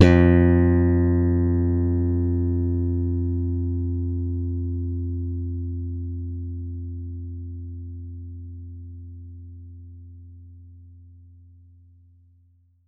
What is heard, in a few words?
Electric Bass, F2; Polyend; Simulacrum Sounds; 1 Shots; Acoustic and Electric